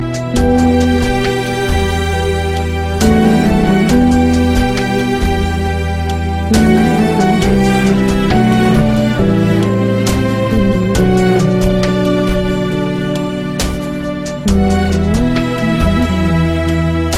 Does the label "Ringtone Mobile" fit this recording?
Yes